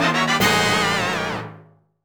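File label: Fanfare Get Rupoor, gameplay sounds; Phantom Hourglass: "Get Rupoor" fanfare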